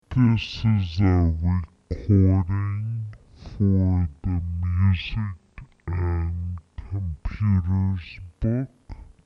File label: pitch down